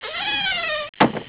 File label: sound leave